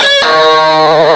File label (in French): guitare; ST-XX Amiga Soundtracker Sample Packs